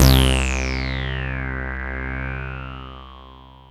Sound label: DANCE SYNT, techno trance; E-MU Formula 4000 Series Vol. 2